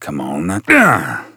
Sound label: Grunt Attack; Owl; Paladins